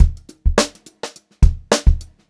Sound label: Funk Groove Drumloops; USB Soundscan vol.38; AKAI